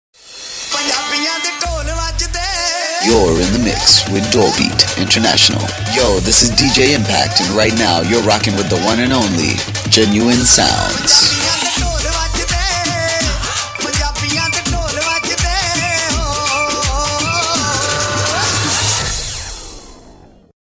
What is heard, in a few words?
Dhol Beat; UK Punjabi